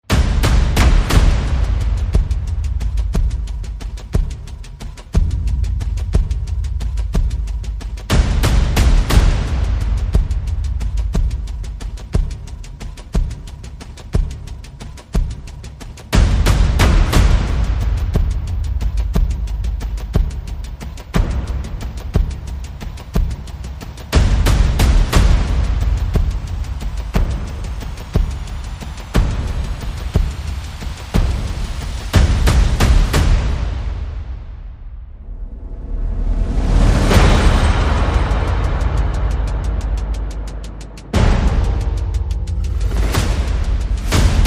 Percussion and Effects

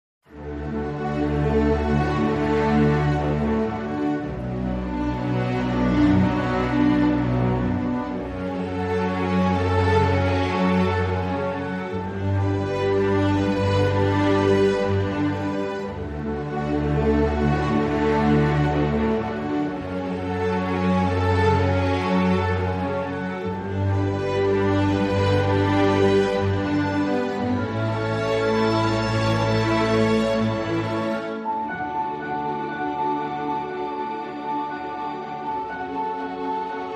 Festive Atmosphere

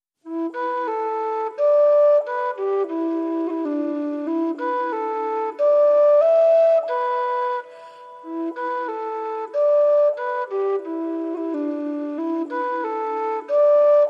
Solo Tin Whistle; 60sec

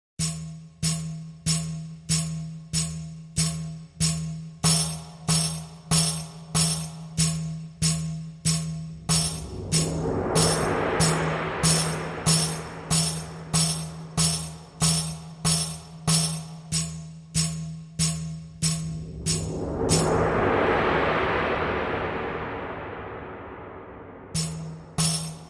Cymbals Only

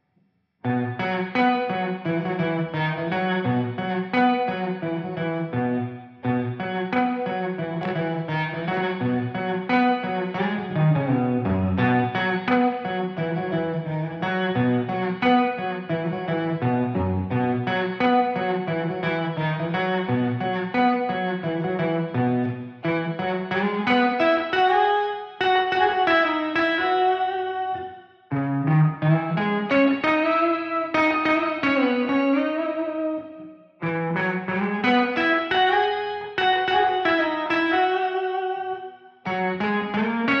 Guitar

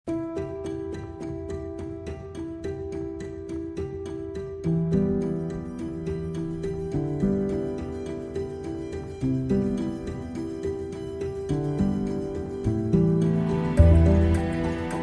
Broken Clock

